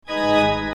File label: accord